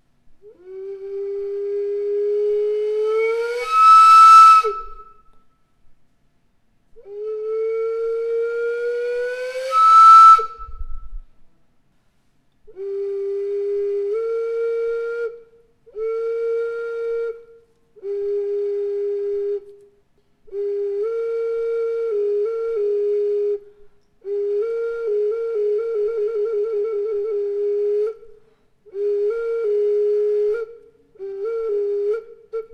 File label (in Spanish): silbato en efigie